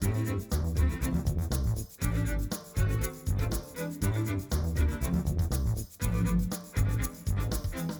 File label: band Dry ambi2bin eqs